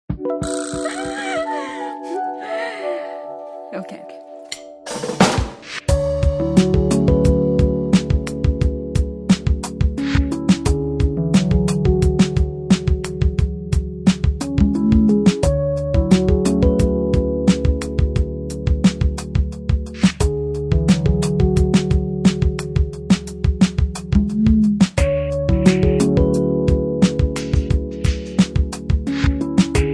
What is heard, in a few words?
Sitar; TakaSitar